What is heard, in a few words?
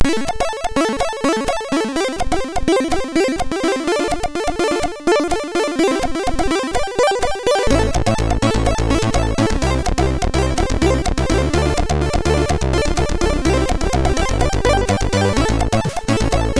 Saturday, MOS6581R3; Early Tunes